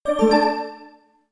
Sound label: notify